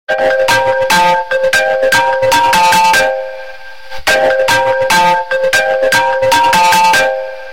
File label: Musical Call